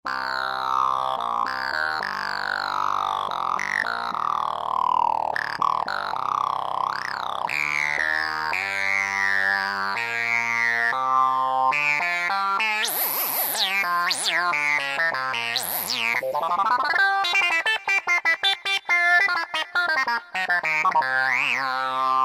Bubbly Lead, Roland JD-990; Factory Patches

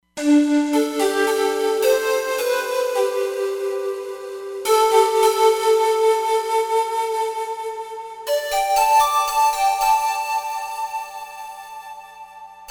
Himalaya Pipe, Roland Fantom XR

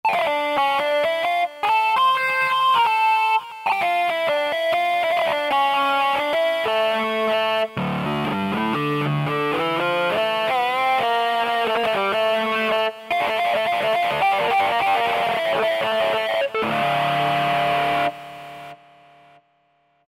Nasty Lead, Roland V-Synth; Factory Patches

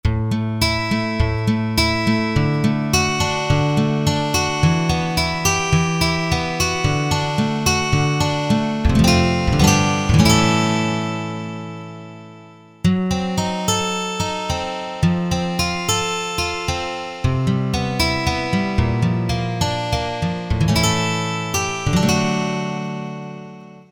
Jam Guitar

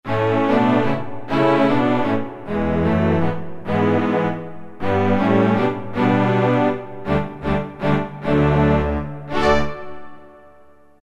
Str Sect, Roland MT-32

